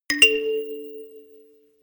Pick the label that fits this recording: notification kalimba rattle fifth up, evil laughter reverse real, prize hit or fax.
notification kalimba rattle fifth up